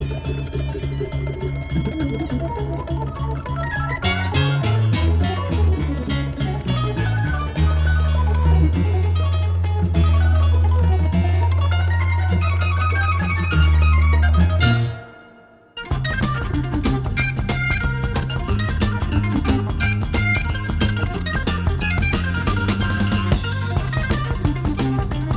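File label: solo, orgues Hammond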